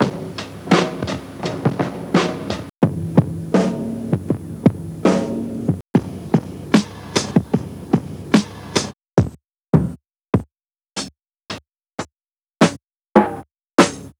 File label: Drum Kit Lo Fi Tape; Nuttkase